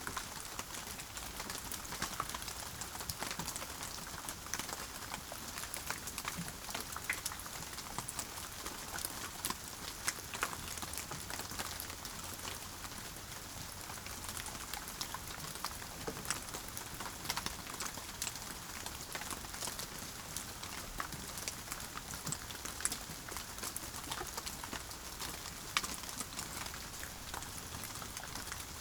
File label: Rain drops on forest leaves; boom library; Thunder And Rain